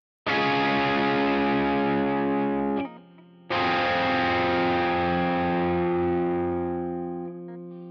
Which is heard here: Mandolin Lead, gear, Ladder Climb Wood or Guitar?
Guitar